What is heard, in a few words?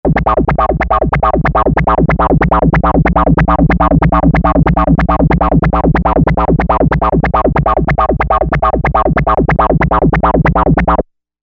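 Harmon Bass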